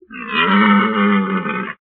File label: reindeer; inlogic-arcticpong